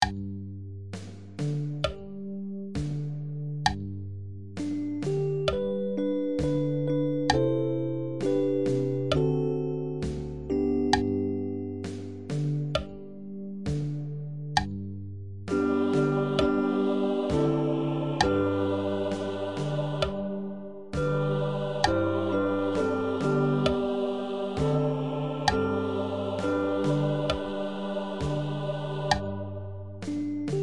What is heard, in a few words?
agnus Dei Bariton Slow; paulusfest